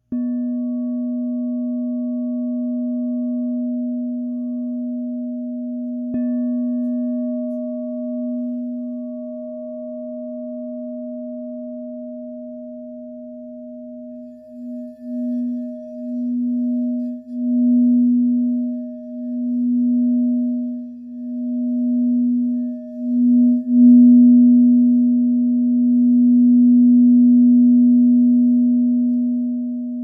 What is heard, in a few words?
Crystal Tones Singing Bowls; Crystal Tones Alchemy Singing Bowls